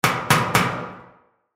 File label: Impact Metal Locker Hit Multiple; Sound Effects:Materials; Blastwave